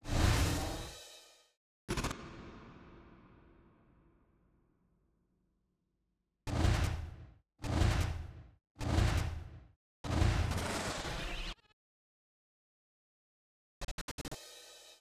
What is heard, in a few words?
Hip Hop SFX; Unsung Theme Nights